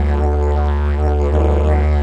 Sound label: Digerido; Best Service; Ethnic Journey Sound Cube